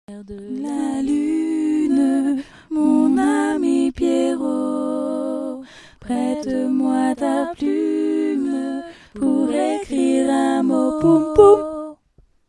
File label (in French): voix